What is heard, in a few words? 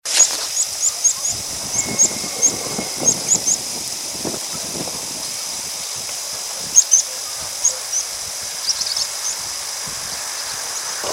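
meadow pipit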